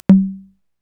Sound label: TOM, Korg EMX-1 drum machine